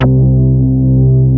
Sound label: TOUCH LO C3, OBMX BASSES